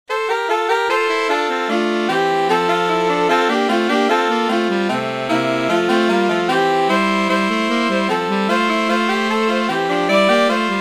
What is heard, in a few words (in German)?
Saxophon Trio S AAT